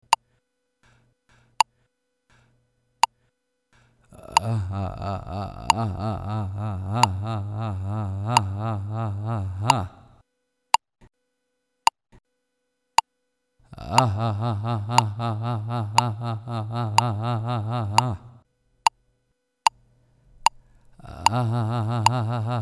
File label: Quadruplets, vibrato